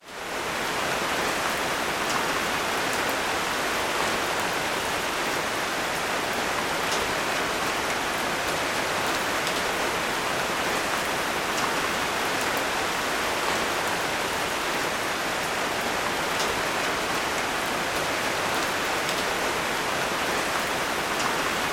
Rain Real Ambi

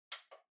Portable Stereo Far Button

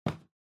TV Hardware RC Put Cushion

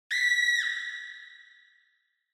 Horror Scream Low Reverb

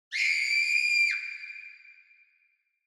Horror Scream Reverb

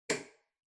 TV Hardware Far Switch

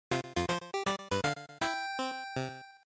General Clear

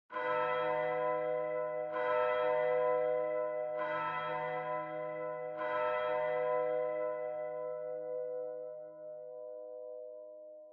Church Bell, Far Low Long

